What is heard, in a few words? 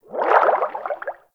Fish Tank Movement Quick; Warframe; Hub; Liset Personal Quarters